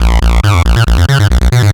bass 138 a#, bass-licks, Techno; instrument-loops

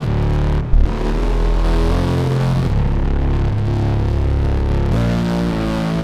Bass, Futurist Drum & Bass

